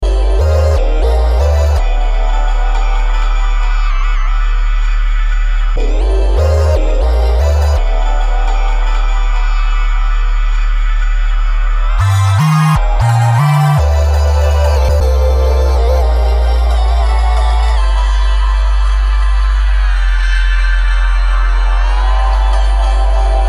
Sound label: Deep Vision Lead; Atmosphere